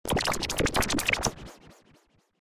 magic poison

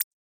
cursor, button

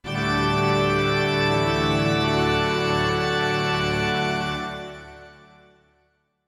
prayer

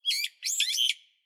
hypsipetes amaurotis cry

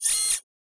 arm action, machine

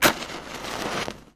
match

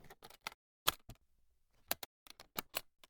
attache case fastener unfasten

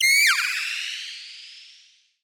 robot eye flash

